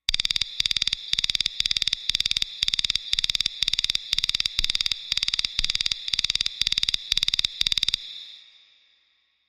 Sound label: Busy Crix Machine Fst T